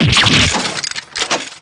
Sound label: break; drebber